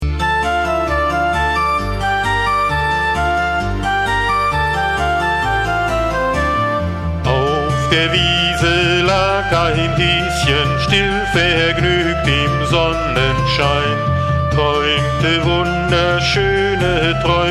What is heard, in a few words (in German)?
kinderlieder alle tiere dieser erde vocal